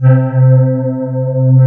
CMI ORGAN; Wizoo - Powered DX

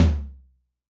Dry Flr, Tom, Yamaha DTXpress II

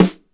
THIN EQ, snares; Instruments & Percussions; InVision Interactive; Lightware VOL-1

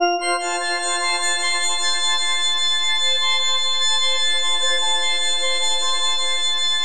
FM F MAJ; Bolder Sounds

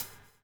bubble; Spectrasonics - Backbeat

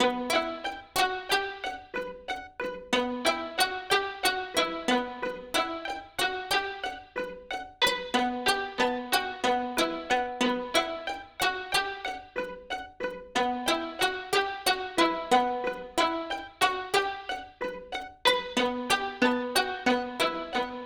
Melody; Sonic Foundry (Sony Creative Software); Westside Underground

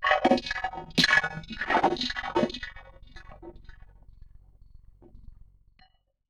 Ring Mod, Cymbal, contact mic; Murf & Ring Mod

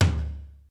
TomLow, Roland JV 1080, Drums

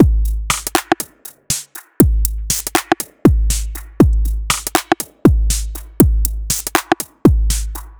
Dub Step Simple, Drum Loop; Sonic Foundry; The Electronic Music Manuscript Collection; Drum Loop Structures

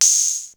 CR78 Open Hat, Ensoniq ASR-X Pro

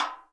REPINIQUE; E-MU Producer Series Vol. 5 – 3-D Audio Collection; 3D Percussives; 3D Ethnics

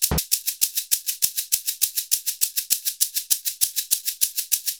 SHAKER, Modern Percussion Loops; Soundscan vol.56; AKAI; SHAKER100

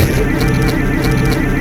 HIDEOUS, HIDEOUS HIT; Spectrasonic Distorted Reality 1

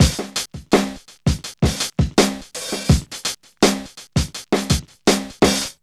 FLOWIN 83, Funky; Zero-G

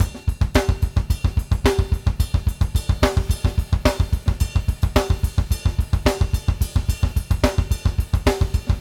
BASIC + CYM, Drumworks

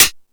High Hat, Drum Machines

